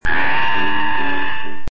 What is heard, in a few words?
SCREECH